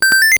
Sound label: Clear Bonus; Flipull; gameboy